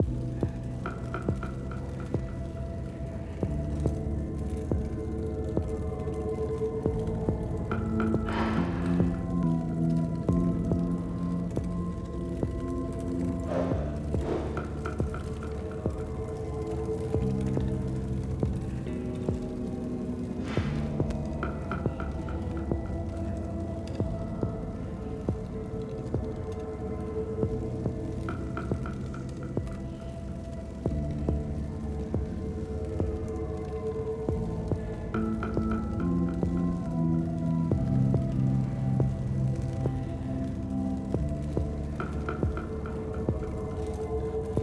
Library Atmos, fade to drums; mcsm music; Netflix; The Order of the Stone

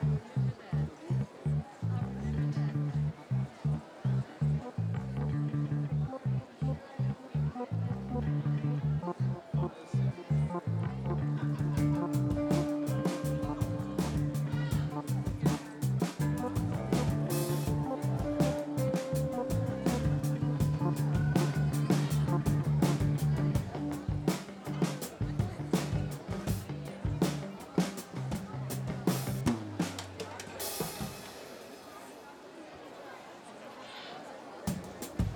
stem manipulated start + drums only; mcsm music; Netflix; The Order of the Stone

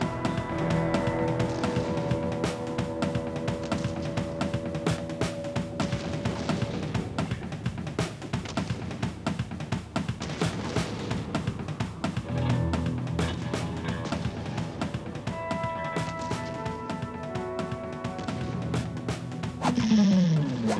drums only; mcsm music; Netflix; fade to drums

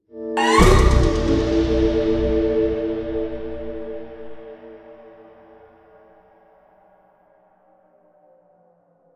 Stinger Big; mcsm music; General Stings

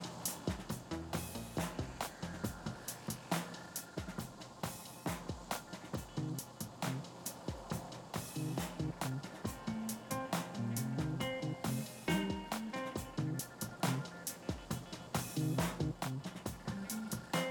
fade to drums; AutoChickenFarm Unknown Variation ~ E; mcsm music; Netflix; The Order of the Stone

